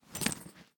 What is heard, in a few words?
minion armor; smite; npc; roman archer